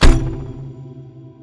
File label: cello